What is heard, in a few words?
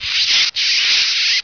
mice; quake2